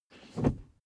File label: suv push forward gear straight; verkehr transport; autos